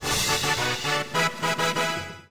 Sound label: fanfar